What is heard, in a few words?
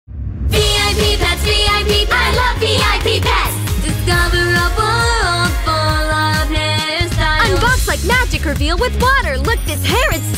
vo and singing; VIP Pets